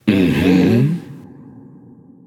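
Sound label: non verbal agree, voice; cernunnos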